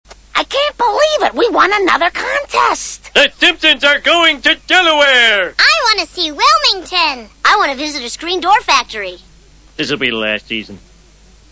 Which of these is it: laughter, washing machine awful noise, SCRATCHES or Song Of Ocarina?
laughter